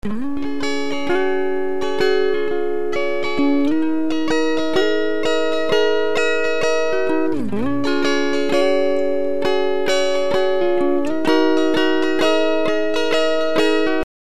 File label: Pitch Shift minor third, Raised minor third; Up minor third